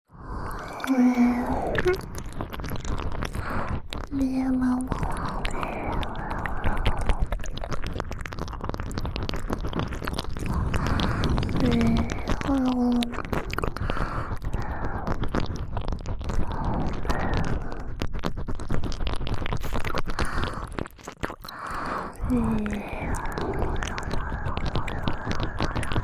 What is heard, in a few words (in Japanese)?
ぐぽぐぽW耳舐め; 耳元ループ; おまけループ（オナサポ）